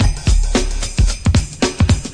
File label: 112 bpm deeper hop, drum loops and breaks